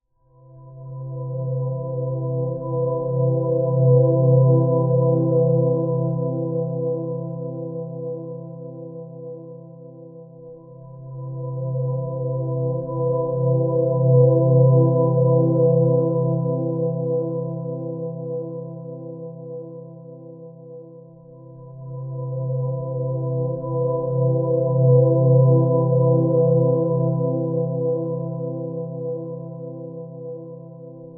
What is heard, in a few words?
Meditation